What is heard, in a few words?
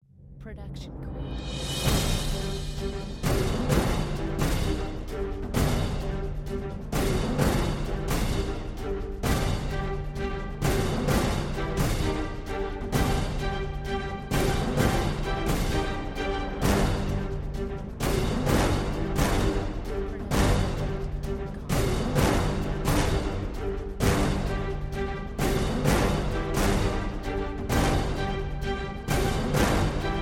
fury bpm 130; soundscrate